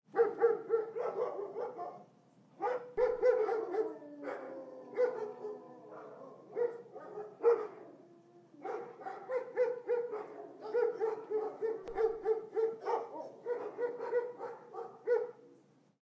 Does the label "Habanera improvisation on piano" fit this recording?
No